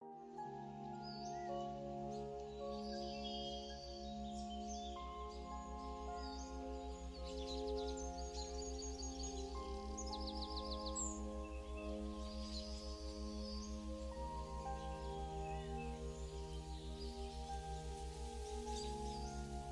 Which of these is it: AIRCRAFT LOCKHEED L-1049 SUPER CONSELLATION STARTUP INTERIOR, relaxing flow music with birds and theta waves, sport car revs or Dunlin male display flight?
relaxing flow music with birds and theta waves